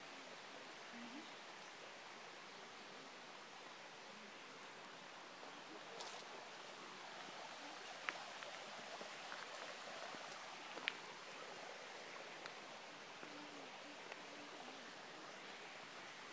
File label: a walk in the woods